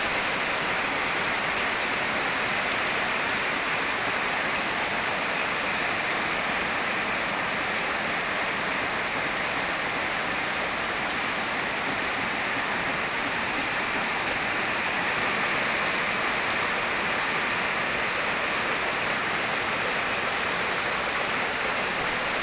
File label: outdoor